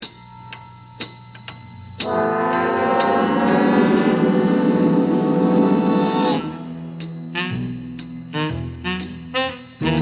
greek intro

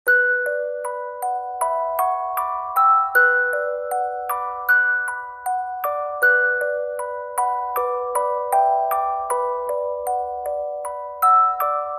Swan Lake Music Box; Tchaikovsky

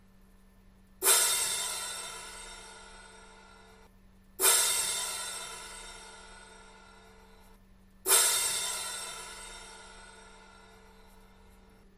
cymbals

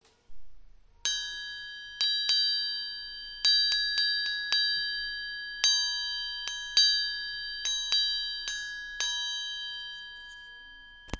triangle

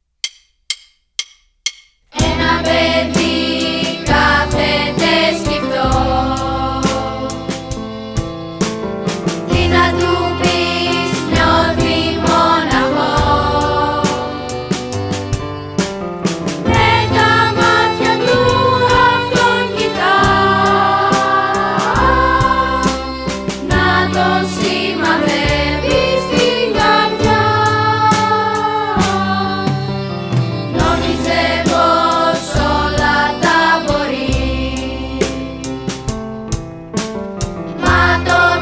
song

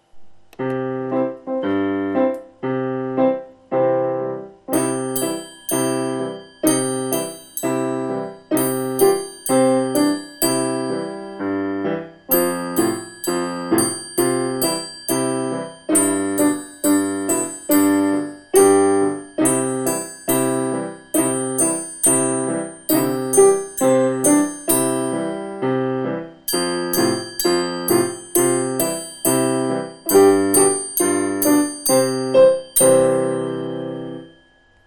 music with triangle

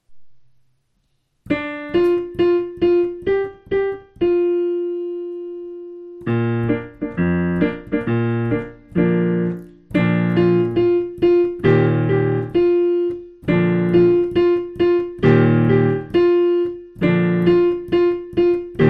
piano; xelidona erxetai

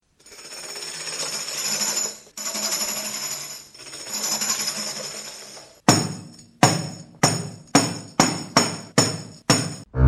instruments

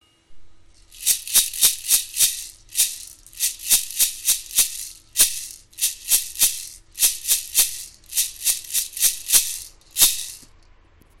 maracas

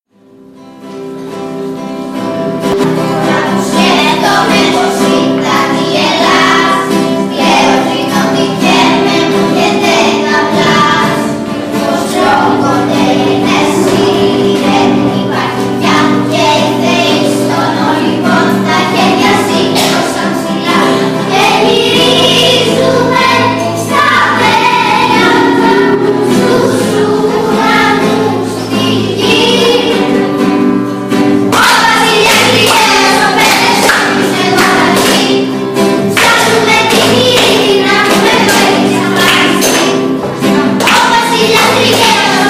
music

